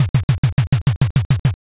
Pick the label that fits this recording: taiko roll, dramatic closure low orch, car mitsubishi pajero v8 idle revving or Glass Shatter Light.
taiko roll